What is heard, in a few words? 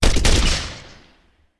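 Artillery Grenade Launcher; Sound Ideas; Guns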